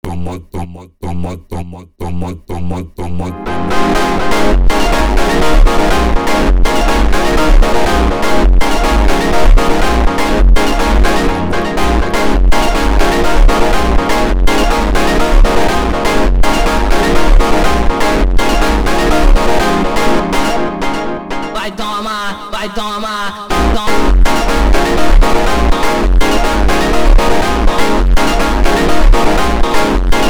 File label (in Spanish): melodia especial slowed